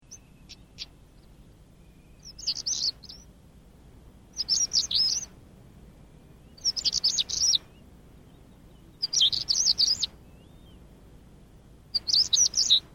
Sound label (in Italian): saltimpalo; faunistico